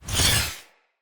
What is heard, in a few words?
Dendrit Staff Caster Charge Heavy Start Short; Weapons; Staves